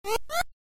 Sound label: air breathe; sonic advance